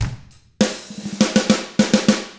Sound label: ROCK, Drums; ILIO; Double Platinum Drums 1